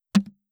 ストン, 効果音

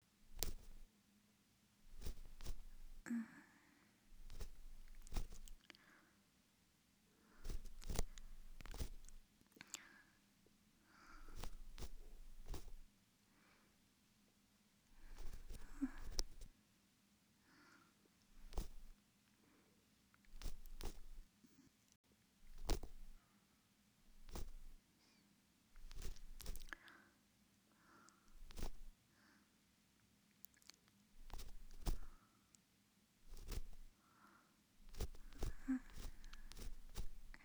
耳かき エンドレス 右

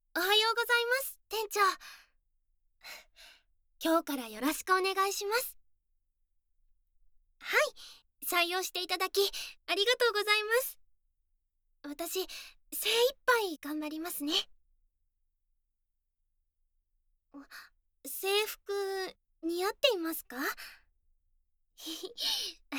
耳かき・耳ローションケア・耳ふー; まじめにお耳ケア研修♪; JKリフレ『体験裏オプセクハラ研修』～れろれろ耳舐め特別指導♪～

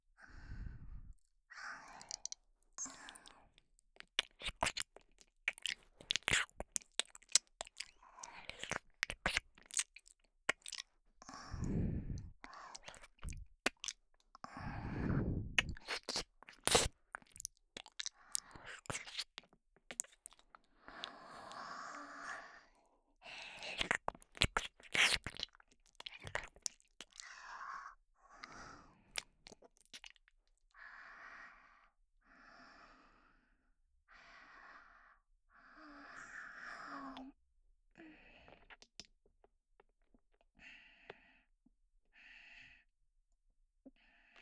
耳舐め ループ, ASMR

